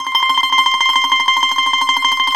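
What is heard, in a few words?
BGL TREM C 5, bağlama tremolo; BAGL.TREM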